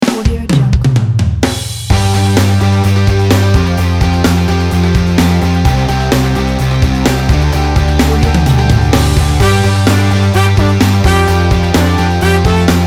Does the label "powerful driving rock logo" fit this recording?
Yes